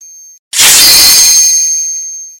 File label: break; pomodoro